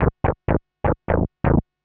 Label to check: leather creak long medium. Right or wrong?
Wrong